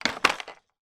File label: toy baby walker plastic place down